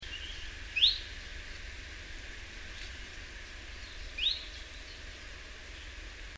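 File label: Chiffchaff (Phylloscopus collybita); Noarootsi, Läänemaa, Estonia, 6 August 2005; Linnut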